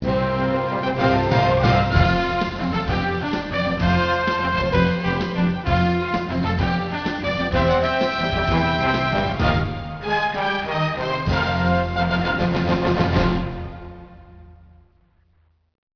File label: News Story Music